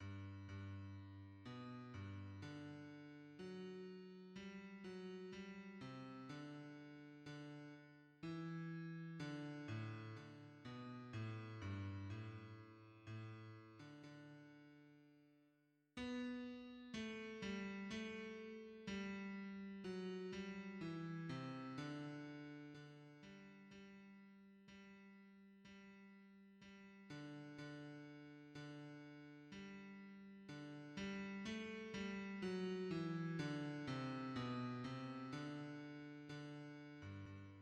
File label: bass; hymns